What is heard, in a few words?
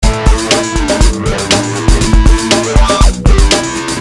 depressing rock music